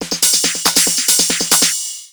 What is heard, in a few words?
TECHNO BEATS 140